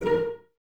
Cellos Pizz A#4, String Ensembles; Miroslav Vitous

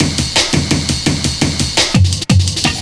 Haze Hybrid Break, Jungle Breaks; M8

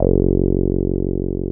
TB 303

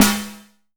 rings, drum; Masterbits - Total Zone

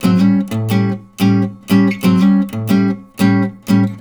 riffs, Blues Guitar; ILIO; Fretworks

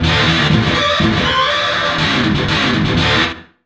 GTR, Chemical Beats

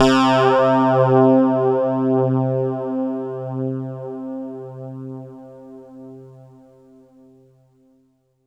PULSATN; East West - Phat & Phunky

